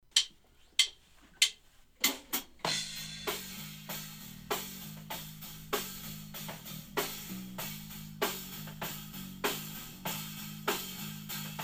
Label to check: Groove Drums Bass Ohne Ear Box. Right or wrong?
Right